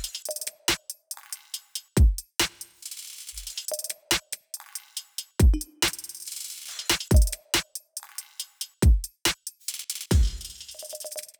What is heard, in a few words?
Drums wet; Freakshow Industries Pocket Dimension